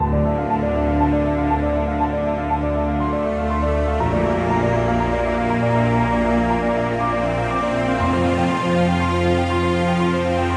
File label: Heroes Looped Dramatic Cinematic Theme; Dual Blade: Battle of the Female Ninja